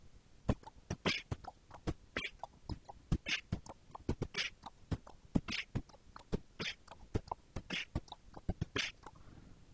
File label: b d b kch, beatbox